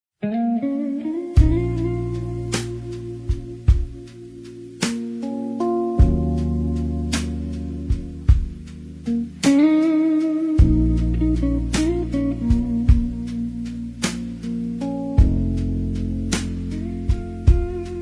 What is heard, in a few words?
Female Vocal, Blues Jazz